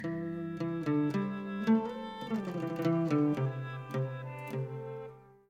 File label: Zhongruan